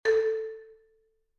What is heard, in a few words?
tone